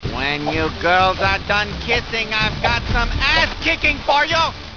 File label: kick